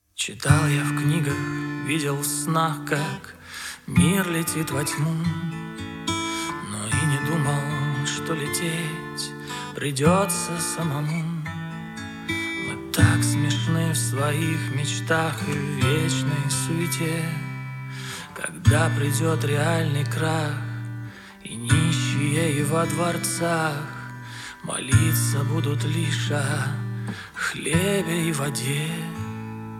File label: guitar version